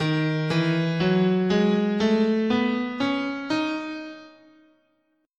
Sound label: Eb Locrian, scale